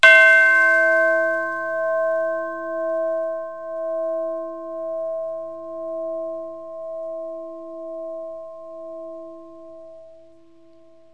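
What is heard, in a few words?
school bell; Multimedia Cube; bells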